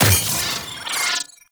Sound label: Drone Death Malfunction; Warframe; Venus; Venus Terra Attack Drone